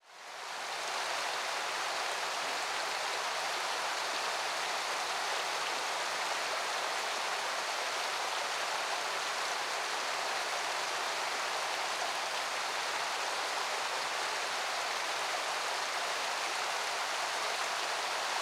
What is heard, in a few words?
Ambience Waterfall Exterior; AdobeCC